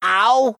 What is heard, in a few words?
meepo death